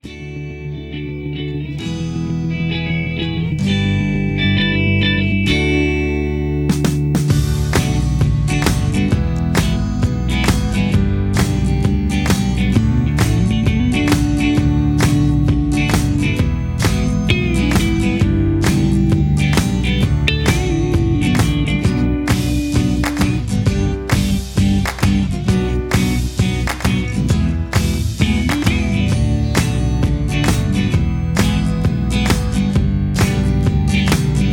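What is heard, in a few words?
Instrumental without Leads without background vocals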